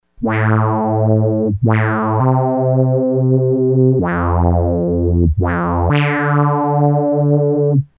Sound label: smooth, synths; PEAVEY PARADOX